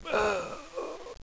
death, player; cstrike

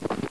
snow, player; cstrike

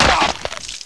headshot, player; cstrike

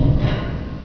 grate, player; cstrike

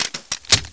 mac10 clip in; cstrike

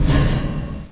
grate, player; cstrike

